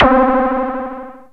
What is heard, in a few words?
ODY, ARP Odyssey